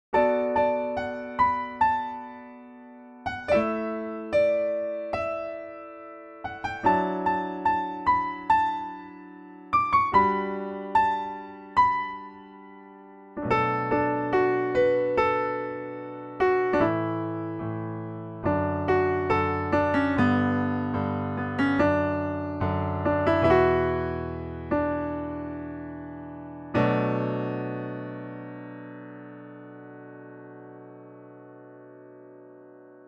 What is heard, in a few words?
pnotec9 classic, Steinway Classic; piano